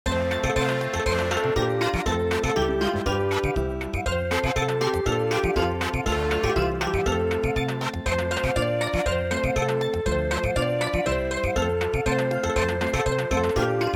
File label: Samba